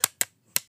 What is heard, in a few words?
Random clicks; Foley; SFX; 7rystan's 600+ FREE EDM SAMPLES & DRUMS